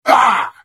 Hit; The Elder Scrolls III - Morrowind

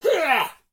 soldier battle sounds effort; Menador; SyrinScape; Iris hill manor

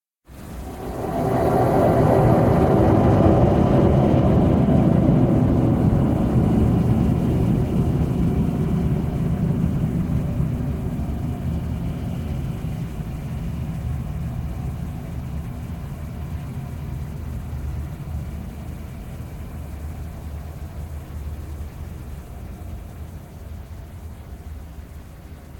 Unsettling noises; SyrinScape; Waterdeep

